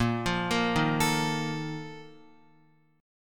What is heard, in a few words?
Bb Major 7th Suspended 4th, Guitar; arpeggiated; JGuitar